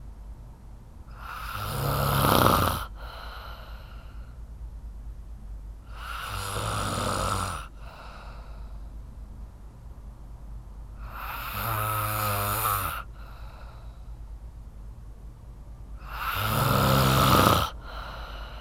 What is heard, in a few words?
Real Person Snoring During Sle; angry neighbor soundtrack android gamerip 2017